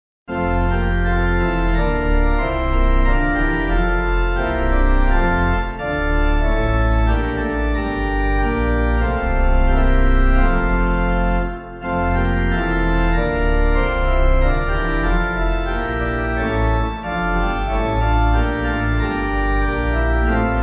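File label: Pipe organ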